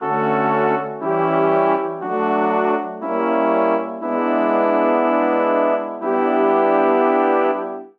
Progression Playful Imaj7-ii6-I-iidim-II7-IV6 D Major 120 bpm, Brass Quartet; MIDI Clips; Ableton; Spitfire Audio